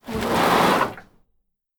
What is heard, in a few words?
dragging nylon fishing net on wood